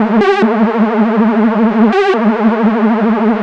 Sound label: TECHNO SYNTH 140; Keys